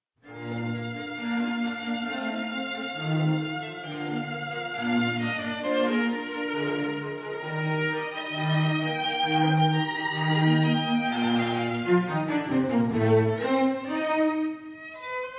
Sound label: Viola Quintet in B flat, Allegro moderato